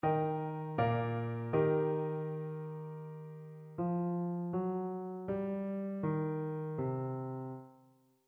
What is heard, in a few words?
melodic fragments